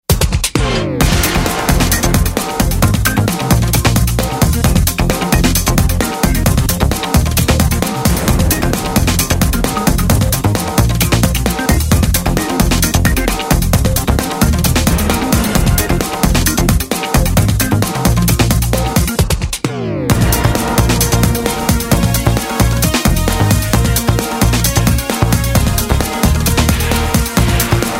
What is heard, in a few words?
Zap Dance; disney; mashup